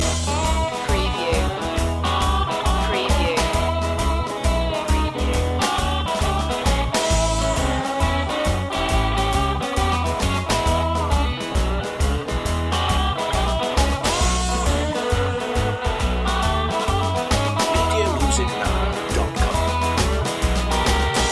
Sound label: rough house blues loop